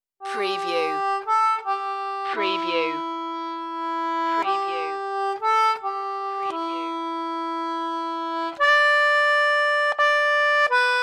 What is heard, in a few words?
solo concertina; silent night